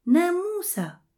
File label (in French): moustique